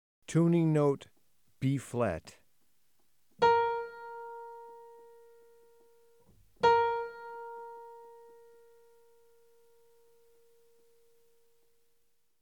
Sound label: Tuning note Bb